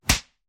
Slap; SoundsCrate

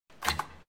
basketball swish

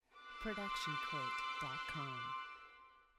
dream strings; SoundsCrate

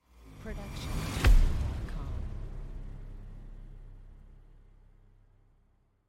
Epic Hit; soundscrate

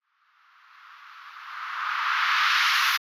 white noise riser no tail; soundscrate

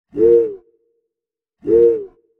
owl; soundscrate

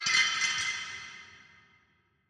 designer metal scraps hit; SoundsCrate

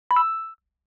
coin; SoundsCrate

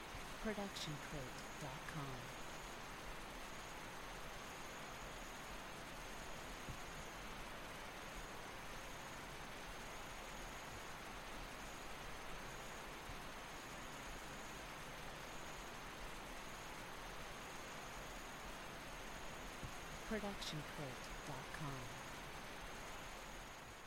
loopable rainforest ambient with distant stream; soundscrate

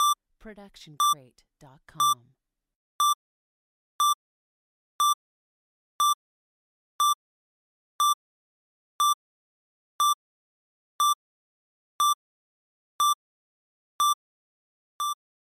Bomb Timer Beeps

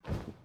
cloth drop; soundscrate

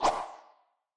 anime whoosh

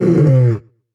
monster roar short; soundscrate

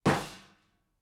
Metal Footfall; soundscrate

